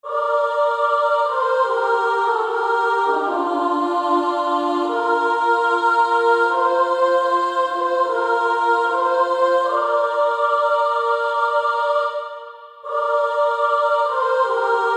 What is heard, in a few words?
Female Choir SA, 2-part; Pass me Not O gentle Savior